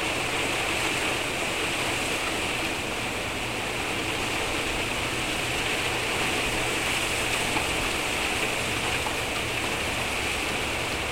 rain wind

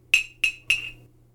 spoon cup hit

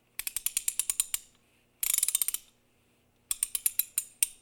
socket wrench

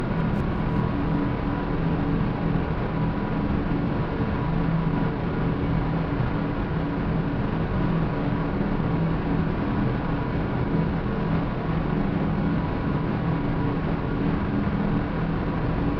drone noise

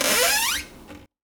door squeak

